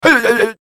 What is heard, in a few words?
Slide; Salt; cookierun